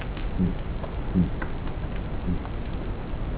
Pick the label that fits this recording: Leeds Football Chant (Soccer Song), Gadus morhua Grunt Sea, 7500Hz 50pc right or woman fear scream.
Gadus morhua Grunt Sea